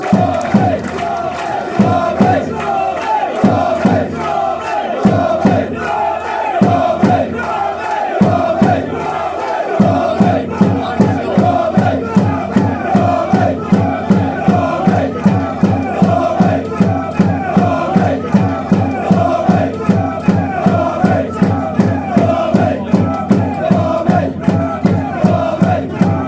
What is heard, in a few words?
blue army drums